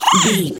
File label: goblin fairy attack